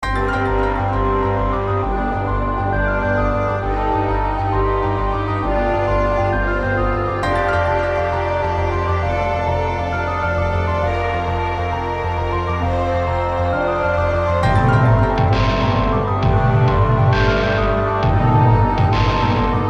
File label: gameover